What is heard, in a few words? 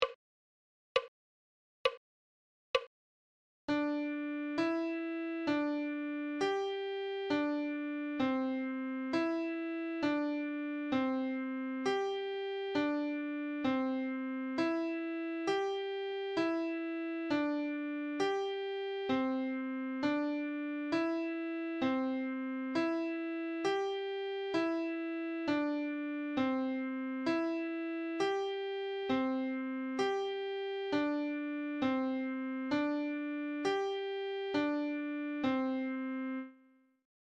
treble clef adagio; read music notes treble clef 4a